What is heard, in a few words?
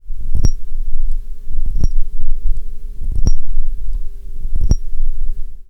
shure sm7b rattling closed; produktionsfehler